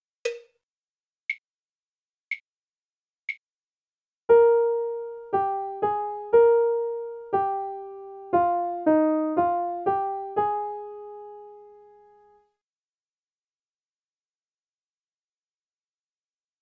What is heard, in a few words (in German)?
Melodie; 5 Takt 3-4